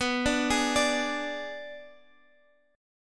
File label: B Minor 6th B